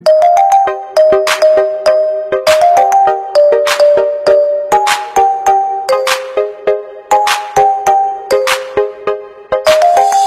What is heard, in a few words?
Marimba